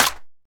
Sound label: clap one shot g key, Royality free clap tuned to the G note. Loudest frequency: 4101Hz; percussion; single hits one shots